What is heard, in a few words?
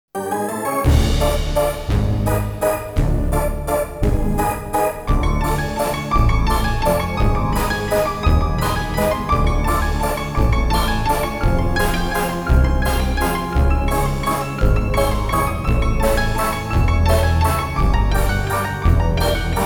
waltz